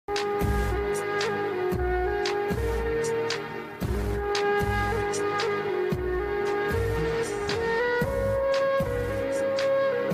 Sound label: bgm; polladhavan